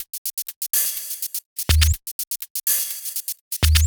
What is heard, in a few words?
Control Top 124bpm, House Drumloops; Zero-G House Fabrik; 90 s Sample CDs